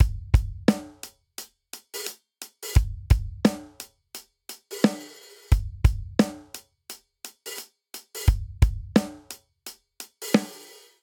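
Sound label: Rolling Hip Hop Breakdown 87 bpm, drums; 4 4; MIDI Clips; Ableton; Factory Packs; Drum Booth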